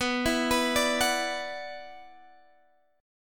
E 7th Suspended 2nd, B, chord

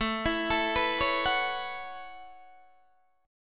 A 6th Add 9th A down Guitar DADGAD

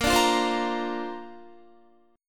Bb Major Add 9th, Bb, down, Guitar

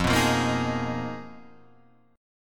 G sharp Minor 6th Add 9th F, guitar chord

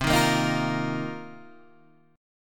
C Minor 11th C down; strummed; JGuitar

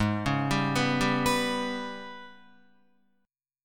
Ab Minor Major 7th Double Flat 5th, down, Guitar; AbmM7bb5; arpeggiated

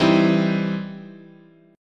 E Minor Major 7th Sharp 5th, chord

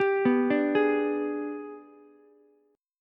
Minor Major 7th Flat 5th, Ukulele; G#mM7b5 arpeggiated; 12,11,10,11

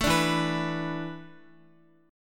E 7th Suspended 4th, Guitar; E7sus4; strummed; x,x,7,7,4,6

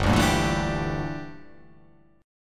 G Minor 6th Add 9th G down

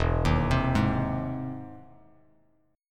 E Augmented Major 7th E down Bass Standard; E+M7 arpeggiated; JGuitar

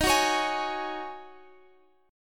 Eb Minor 11th Eb down Guitar Open F; Ebm11 strummed

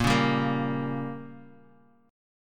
A sharp Minor 6th, down, Guitar, Half Step Down

